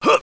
off wall; Heretic II; Player

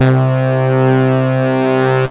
tromb C2; Flashback

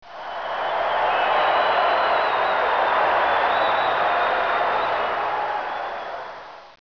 Cheers

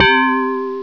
Gong; HyperComposer DEMO

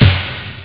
short; OctaMED SoundStudio V1

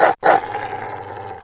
Attack Dog; urbanchaos

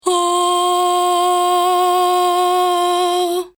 Vocal

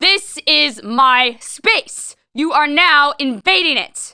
BUMPING; Messiah; DOMINA

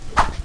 Bat swish; Fastball Fractions

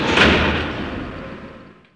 CAM STOP; moonchld

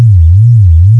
Pulse w Big Bass; Asterax

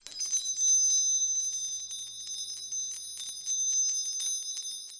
Sound chimes; The Interactive Alphabet

